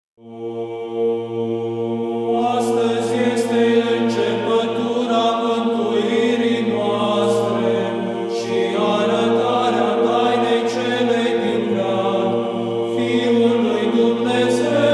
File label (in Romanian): Troparul Bunei Vestiri, glas 2; Aparatoare Doamna